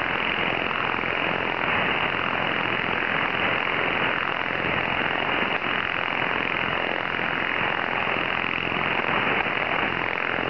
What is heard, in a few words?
noise